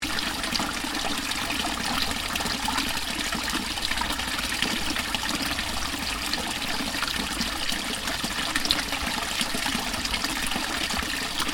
Water pipe loop, Water: running from pipe into stream, loop